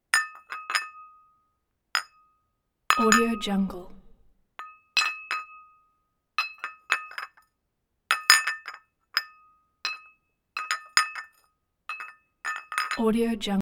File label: tea cup impacts